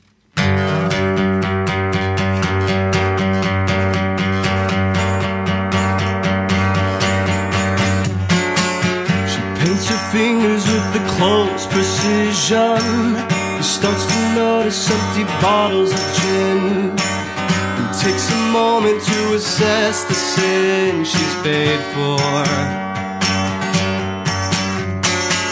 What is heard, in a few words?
acoustic